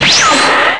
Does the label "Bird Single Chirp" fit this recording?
No